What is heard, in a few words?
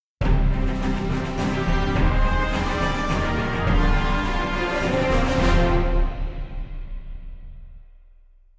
Bumper; assassins creed iii; java android mobile